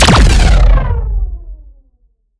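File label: Shot Large Blaster Distorted Cruncher Boom; Robobeat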